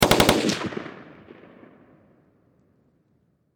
Warfare Machine Gun M16 Short Burst Distant Perspective; Farther Away